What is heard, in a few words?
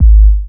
Dunk, Drums, Industrial